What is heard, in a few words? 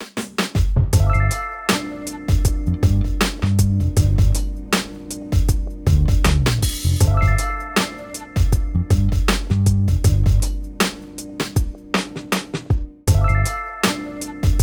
Rap Loop; The Jackbox Party Pack 5; Jackbox Games